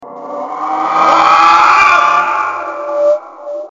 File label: funny friend idle; slender; zerosiki